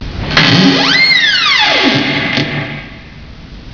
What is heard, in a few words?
dooropen